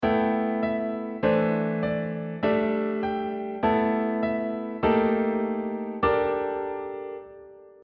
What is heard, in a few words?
melodic fragment with contrasting harmony